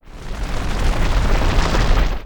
sfx Atrophy; lego ninjago rise of the snakes

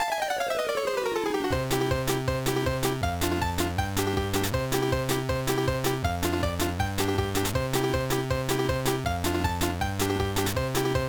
BGM; Shikakui Atama wo Marukusuru Advance Kokugo Sansuu Shakai Rika; GBA

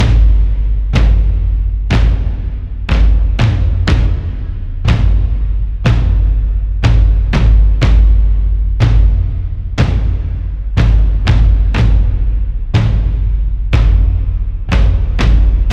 Drums

